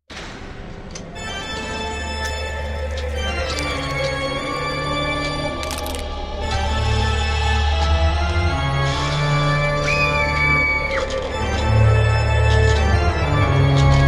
Halloween orgel

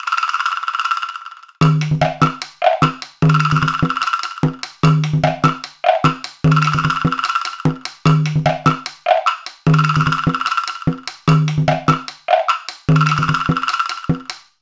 Log Drum

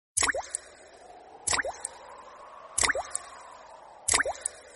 Water Drop sound with wind sound

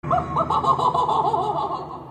laugh; Pennywise

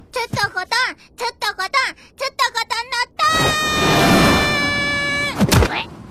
The Sound of a Whining

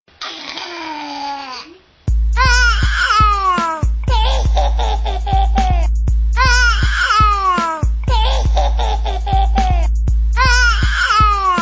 Baby Hip hop laugh

